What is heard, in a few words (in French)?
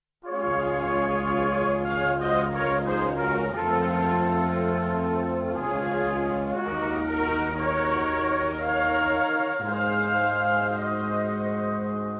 baryton